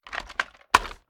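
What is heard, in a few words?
sniper reload up; gmod; iw8; xmike109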